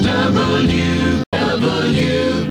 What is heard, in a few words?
jingles comparision original resing; drake aa image pack